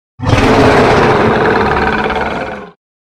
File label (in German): drachen schrei